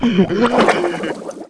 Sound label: DROWN; tigger